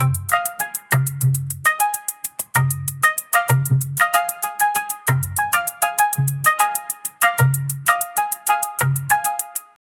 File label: Bengali dhak beats during, festival